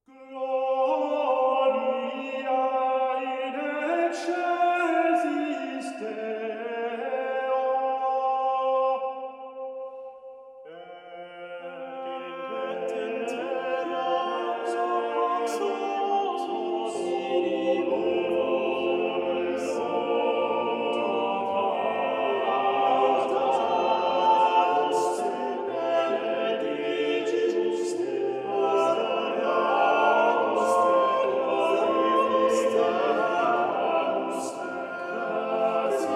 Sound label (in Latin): Missa Mittit ad Virginem Gloria